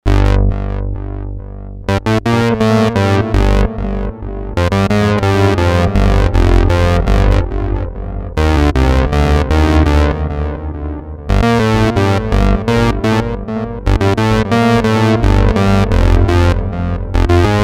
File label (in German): Roland JX03 dicke Backen mit Delay